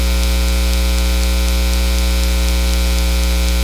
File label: Midi Click Using Midi Input; RME UCX